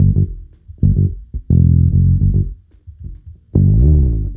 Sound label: Bass Loop, Ambient Grooves; Sonic Foundry (Sony Creative Software); Basses